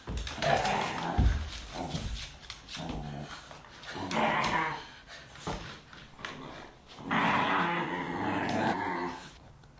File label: crazy growling dog; Sound FX Real Life